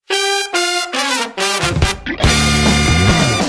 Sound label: fanfare; lucky channel